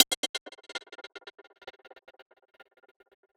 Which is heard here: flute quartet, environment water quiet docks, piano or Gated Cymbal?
Gated Cymbal